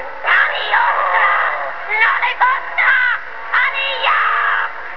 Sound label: chant